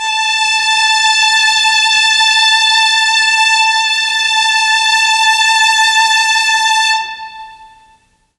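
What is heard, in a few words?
A3 violins, mellotron; CHMB 3 VLNS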